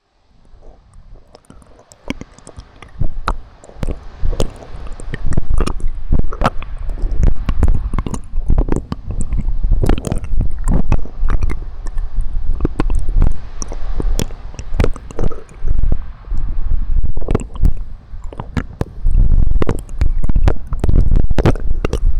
左耳奥舐め, ASMR

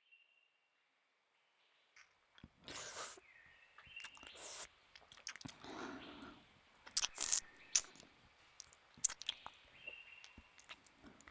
耳舐め天国

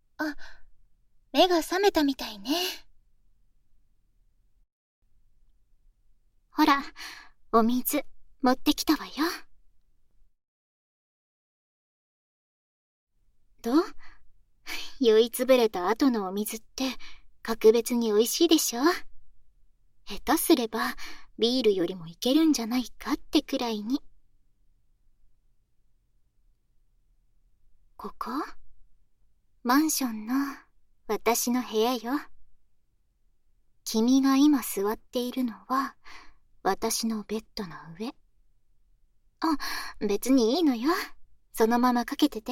耳舐め; 効果音なし

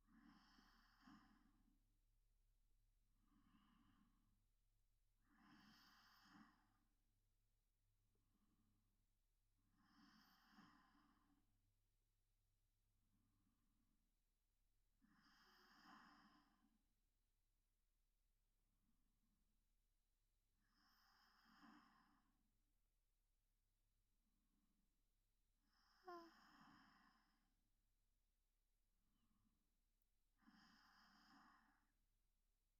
添い寝 睡眠導入用(寝息のみ); 添い寝トラック; 高音質版

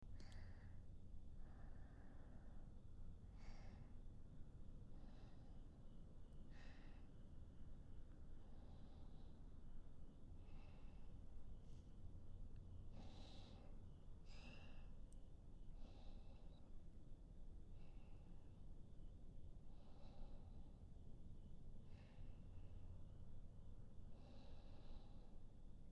寝息; しっかり者の妹と過ごす性的な一ヶ月 つづき+日常; 妄想研究所

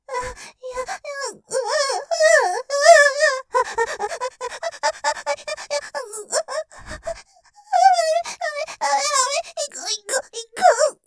喘ぎ ふやふや声で おちんぽおねだり, ASMR; ステレオ; ループで楽しむ！シェフの気まぐれ抜き抜きコース

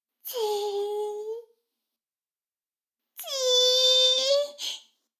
シコシコ音声; 逆時間停止されて

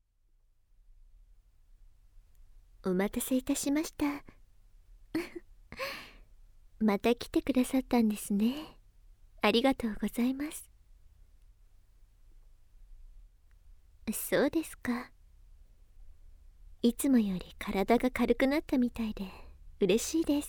耳舐め・オナホコキ, ASMR; とろとろローションオナホコキで極上射精

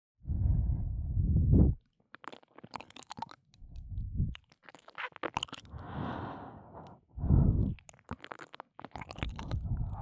吐息耳舐め 右耳; 耳舐めコレクション

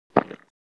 gokkun, ゴックン音のみ; 射精; 膣内射精

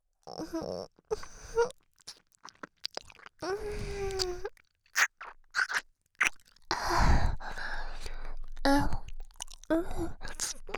エッチしよ 耳舐め喘ぎ; DL製作班; ハーレムオナサポ 4人のメイドがご主人様のオナニーをお手伝いするバイノーラル

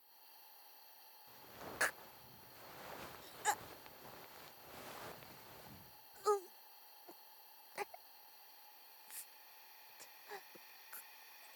ガス

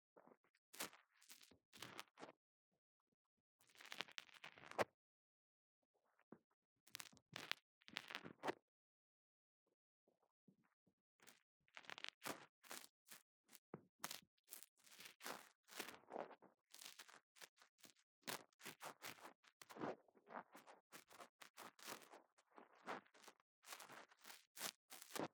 耳かき 右, ASMR

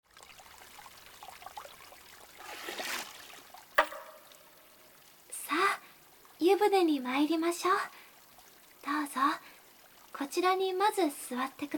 頭皮＆お耳のマッサージ; 温泉で; 頭皮・耳

